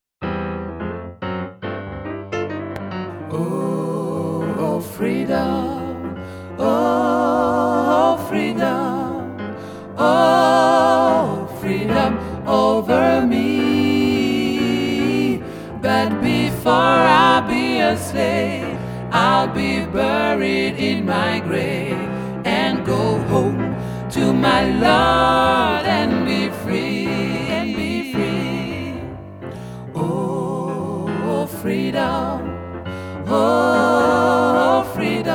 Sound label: Tutti, Gospel; Oh Freedom